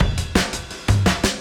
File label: Beat Style E 170, breaks; 170bpm